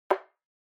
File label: Megaphone Noise Dry Click